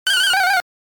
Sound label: Monster Moving; arcade; Dig Dug